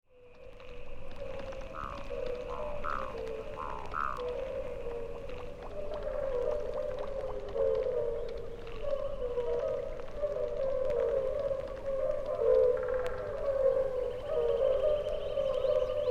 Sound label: birdsongs